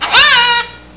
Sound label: bird talk hello